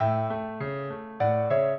minuet, piano; Mozart

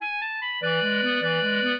clarinet; Mozart

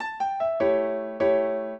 piano; Mozart

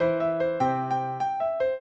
piano; Mozart

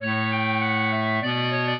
clarinet; Mozart

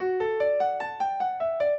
minuet, piano; Mozart

